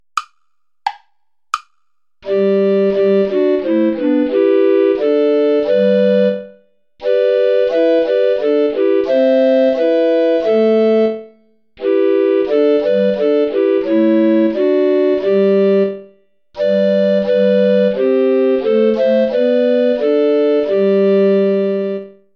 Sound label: play along without soprano recorder; goudimel claude; sus serviteurs seigneur